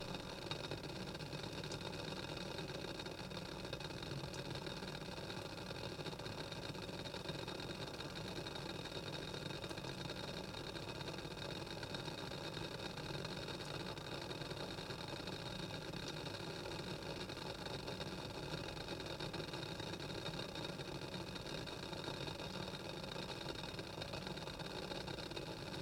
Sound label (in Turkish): kettle hafif kaynayan su sesi